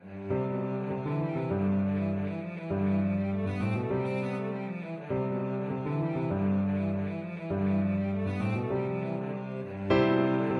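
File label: violoncello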